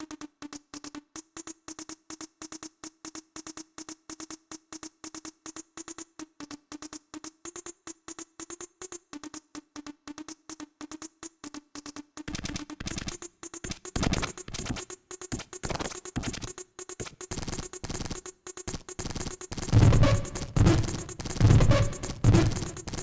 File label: glitch kit